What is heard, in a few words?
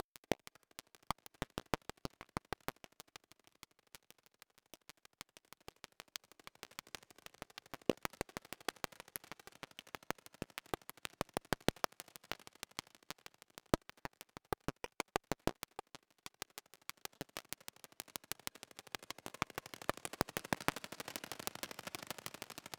Music NOISE MOVE; CP Music Biome2 NOISE MOVE Phase02; Cryptical Path